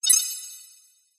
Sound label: multimedia game tone short bright futuristic beep with delay action tone; zapsplat